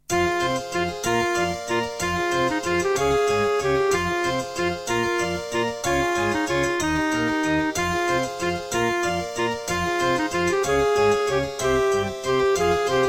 Miditemp DS-48 CleanWave32; Death Gate

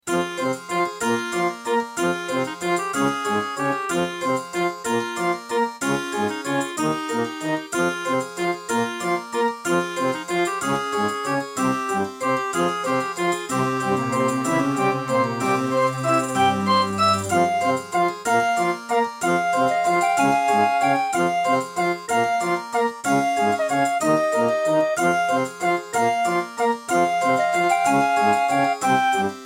Track 6, Pine PT-2030 Vivaldi Xtra Wavetable; Death Gate

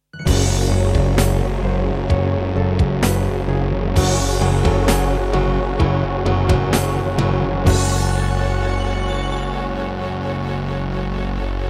Korg NS5R; sigil doom episode 5

